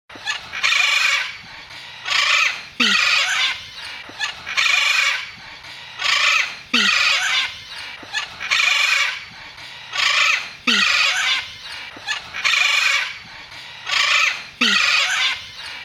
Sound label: Red Fronted Macaw, Parrot Calls